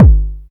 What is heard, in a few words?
kick drum single hit G sharp key, kick tuned to the G# note. Loudest frequency: 146Hz; kick drum samples